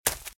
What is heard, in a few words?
Footsteps - Leaves - Sprint - Left; RadwinIsland